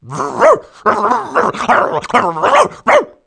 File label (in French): CHIEN; Mobiclic46